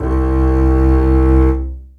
Double Bass Solo Legato; Ableton; Orchestral Strings

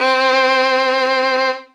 Violin Solo Legato; Ableton; Orchestral Strings

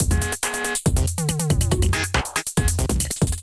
Kit groove 140 bpm, Glitch and Wash; MIDI Clips; 133 - 151 bpm; Preon Kit; Ableton

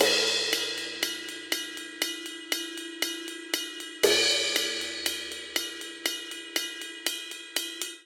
Classic Rock Cymbals Only 119 bpm; 4 4; MIDI Clips; Ableton; Factory Packs; Drum Booth

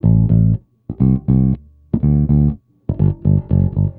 120 Pop 70s Bass Am, E-Bass; Pop Rock

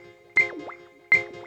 080 Reggae Chords G#; World

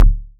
modular quick, Kickbank; Ableton Foundation FreePacks

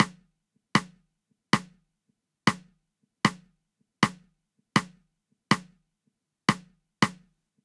Bossa Nova Snare Only 120 bpm; 4 4; MIDI Clips; Ableton; Factory Packs; Drum Booth

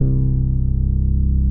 Synth Bass; Sub Species Synth Bass; Ableton; Beat Tools

